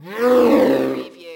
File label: CREATURE SCREAM SHORT